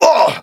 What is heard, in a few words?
Wound; battlerite; Vanguard